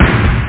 sdrum; 8,363 sample rate; Amiga 8-bit Sampled Voice

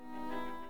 ballet menu popup

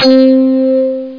Tech Bell

